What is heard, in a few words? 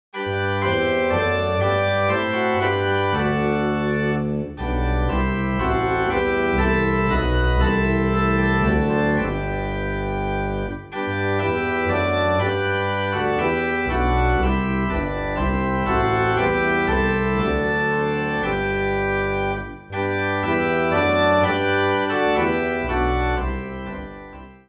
Organ in G; Praise To the Lord The Almighty